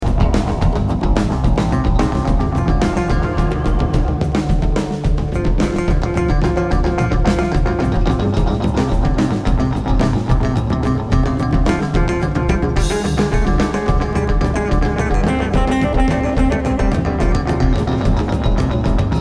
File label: Drums and Bass